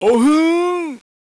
sick tiger attack; Eft

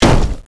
Repair Wall

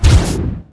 Attack; Eft; Fire Haetae

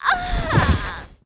HARPY Die

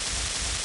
bullet Dynamite Fire

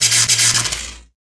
dracula attack; Eft

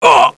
EAST GUARDIAN hit; Eft

